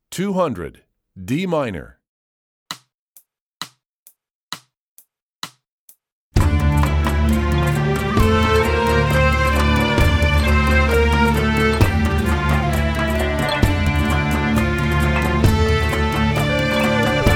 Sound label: D Minor, Strings; EE2000 Book2